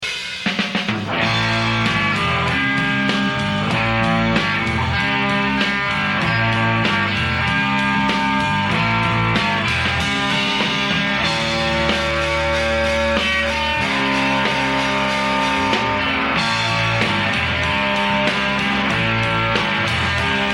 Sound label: zombie blues